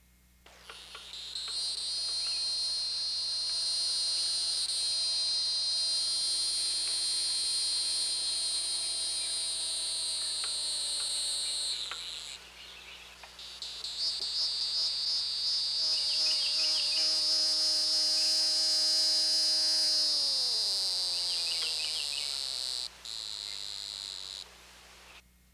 Neocicada hieroglyphica

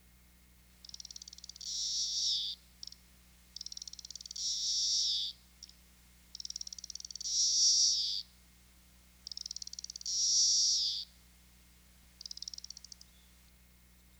Magicicada cassini

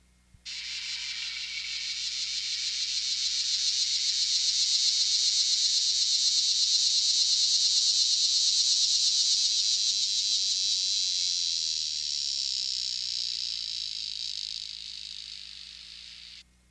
Tibicen chloramera

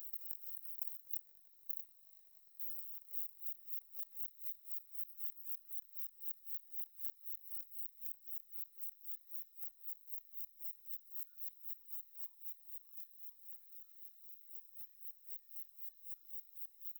Symmoracma minoralis; 15kHz HP

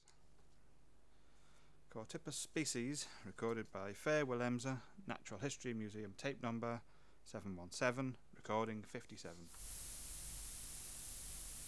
Chorthippus

